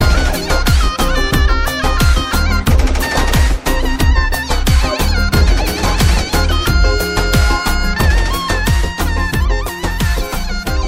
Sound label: superhit iraqi